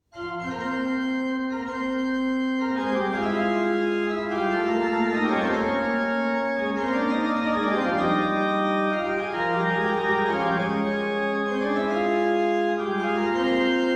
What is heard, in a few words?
Bach Organ Works; Clavierbung III; BWV 681; Fughetta super Wir glauben all an einen Gott